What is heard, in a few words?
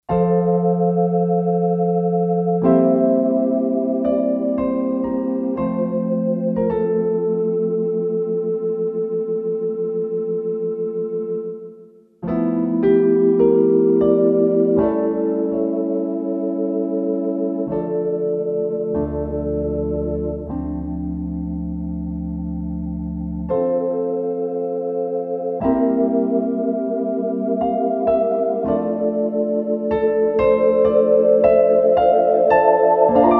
Church Piano; Roland SC-880; Factory Patches